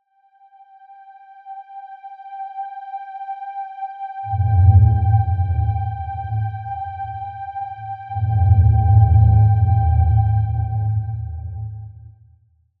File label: Stress Suspense